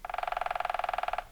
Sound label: woodpecker; ambient